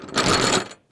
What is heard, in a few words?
door handle shake; SoundsCrate